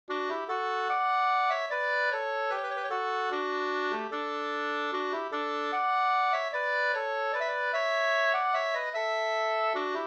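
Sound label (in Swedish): vals, two-part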